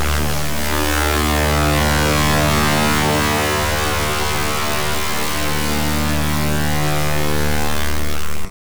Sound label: nature boardwa